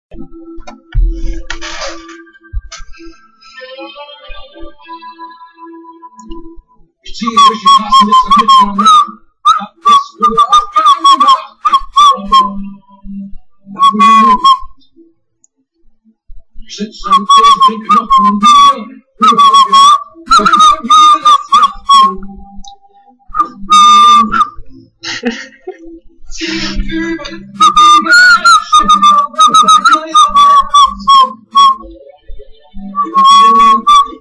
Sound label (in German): Variationen von der Flöte